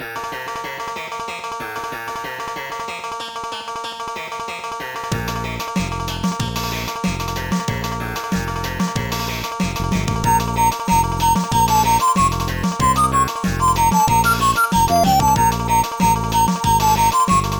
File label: funny ballad; Trackers; Modland